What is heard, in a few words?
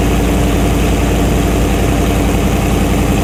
Ford F150 Low, car; Car Simulator 2; android